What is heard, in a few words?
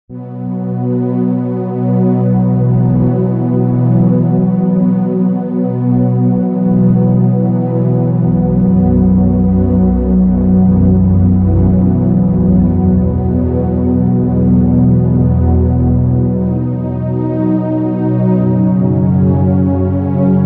Underscore PAD; Worship Flow